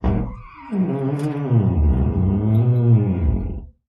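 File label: door creak large, squeaks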